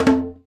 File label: Conga Link; Roland BK-5; Percussion